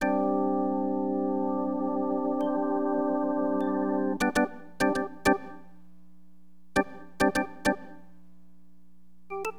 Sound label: ORGAN; Keyboards; LiveAct Pool; AcidJazz Funk